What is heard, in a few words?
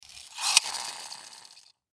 Toy Car Pull Back Wind Wheels Spin; Toy Cars; Toys; Foley